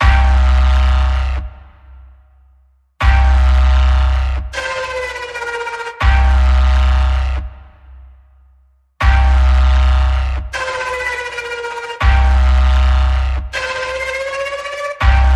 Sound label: Mechanical Massacre Pulse C, Key of C